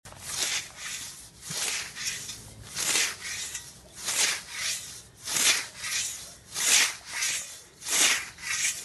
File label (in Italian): falciare il prato con la falce